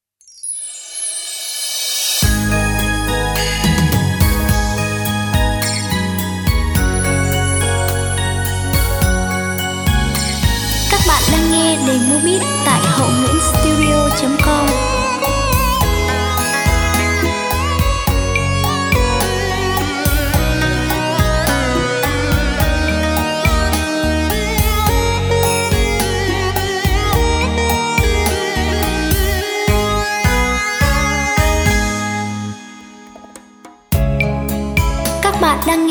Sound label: beat, playback